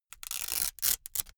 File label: velcro large open